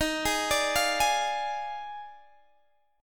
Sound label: Eb Major 7th Suspended 2nd Suspended 4th, Guitar Open D; down